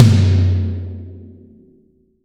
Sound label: TOMS, Real Mega Drums